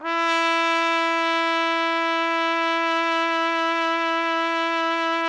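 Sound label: TPT, trumpet; Roland - LA Composer vol. 3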